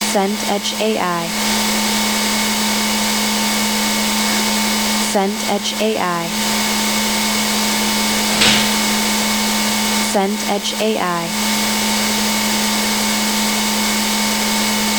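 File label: ambience supermarket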